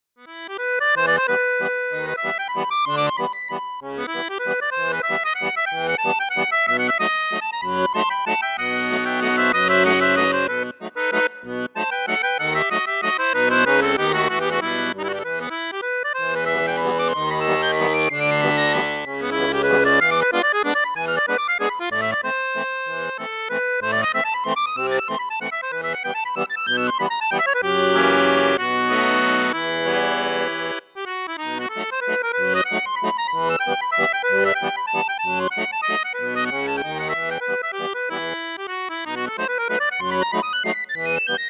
registre flute juste, accordéon; fratelli crosio super pro 9

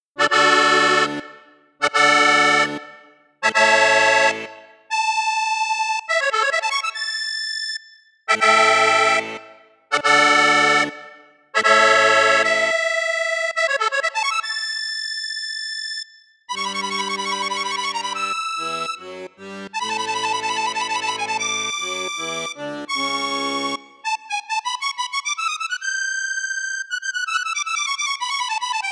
registre flute basse flute juste flute haute, accordéon; coope armoniche diamante 805

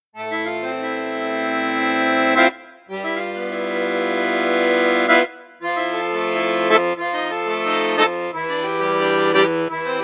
registre basson flute juste, excelsior accordeon

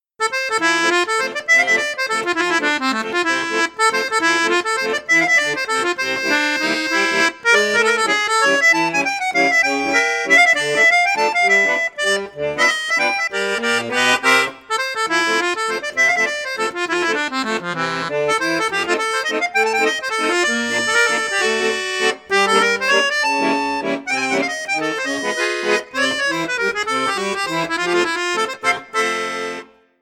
registre flute juste swing valse, accordeon; perle d or

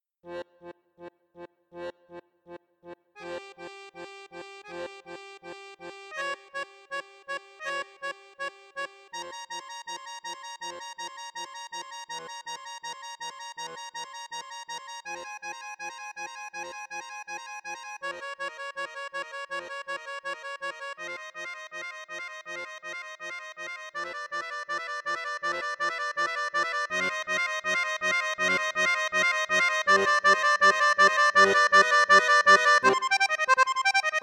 registre flute juste piccolo, fratelli crosio super pro accordeon

